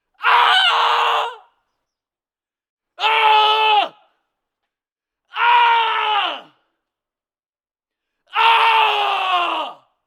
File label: Man Screaming A